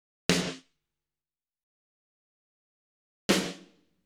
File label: snare 1 wet + snare 2 dry; Sound Sampling Collection VOL-5; Drums & Bass Fusion Funk Effect